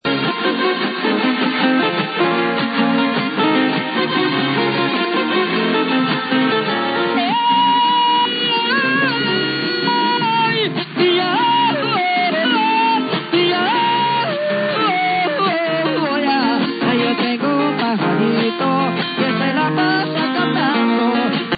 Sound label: Grito Example, Panama